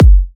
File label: clean kick drum sound g key; Duration 0:01; Samplerate 44100 Hz; Bitdepth 24